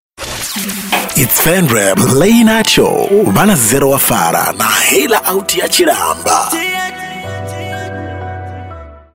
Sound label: jingle